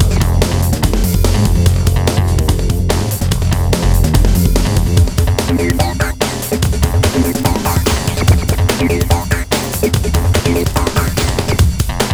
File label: Hardcore Breakbeat, 145 Fmin; Big Fish Audio - Hardcore Breakbeat Action; Hardcore Breakbeat Action CD1; 05 Engine